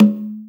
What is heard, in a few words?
Conga; Equipped Music; Loungin House